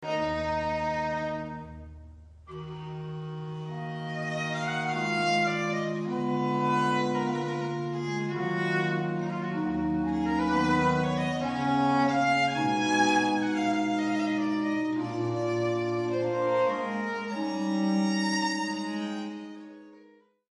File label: Sonata for violin basso continuo